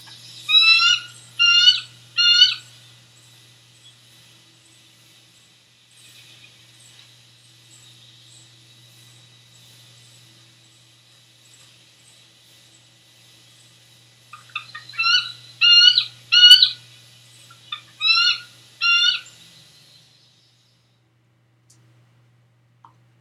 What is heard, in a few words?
Eagle Peal Call